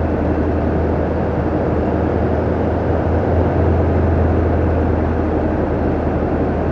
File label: distance airplane medium idle; Hearts of Iron IV Death or Dishonor